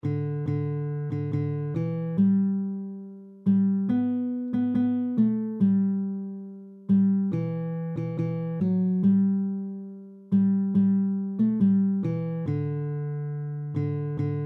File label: worried man blues g, gitarre akkorde; einfache lieder